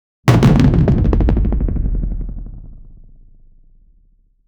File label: balloons fall bounce and, latex